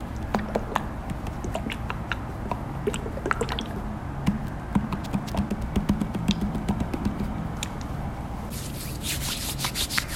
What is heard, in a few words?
hand sanitizer